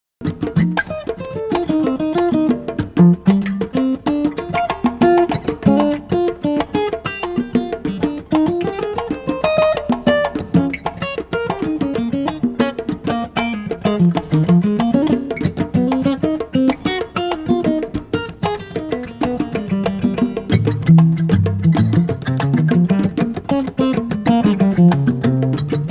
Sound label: solo, guitar